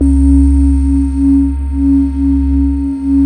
PAD, Synth Pads; SYN-PADs; Synth-Pads 4

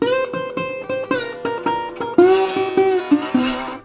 120, Blues Guitar; ILIO - Fretworks

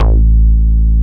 HOLLOW BASS; Masterbits; Dance Trax

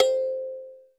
SANZA, TONAL PERCS; Crypton - DrumBase5000

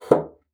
BASSOON; Xsample VOL-6

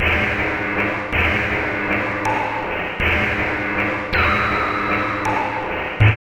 LOOP, Tekno Industrial; East West

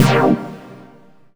Stab C2, Club Techno; Zero-G; Stabs

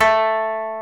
KOTO A4, Earth Tones

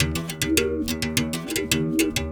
Tar, World Percussion

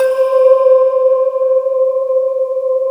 bowww C5

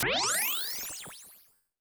percussion, Roland TD-20

